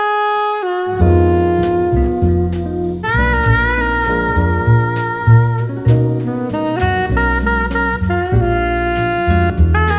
jazz

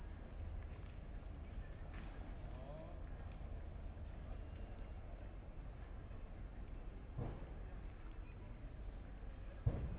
noise

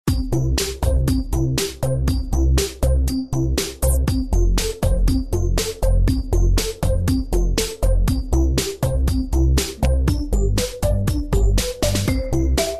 melodia; jelly truck

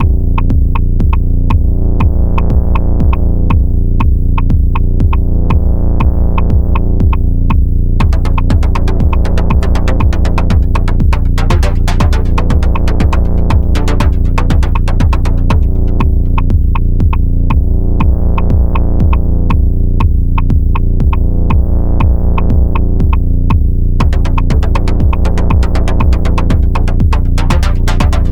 Game Scratch; Dmitry Mazin

